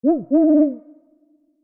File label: Owl